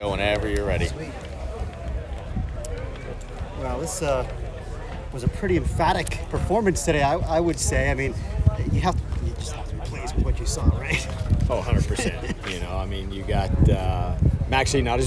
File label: Foley Field